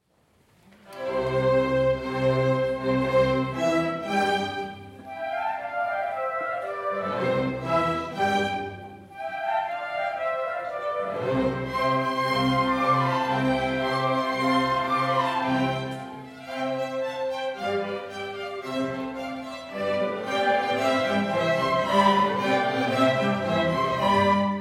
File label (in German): Marsch C-Dur; Mozart